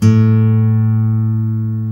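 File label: guitar acoustic; Sound & Vision; Gigapack I CD 2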